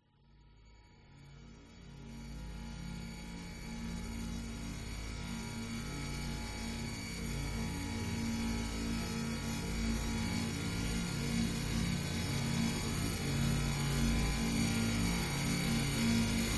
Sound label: texture bright electric futuristic sci fi; scott lawlor